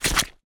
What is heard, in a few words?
foley footsteps boots wet squelchy out of water